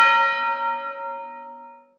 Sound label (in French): cloche; Limonest